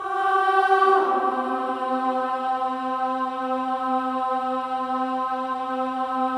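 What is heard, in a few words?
Voices, INTERVALS, 5TH DOWN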